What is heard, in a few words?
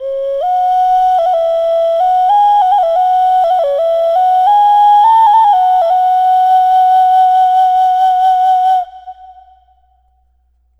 Aztec Dog Occarina